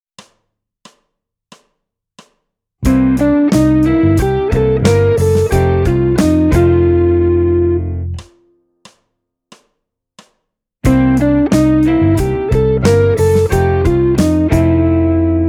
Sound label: blues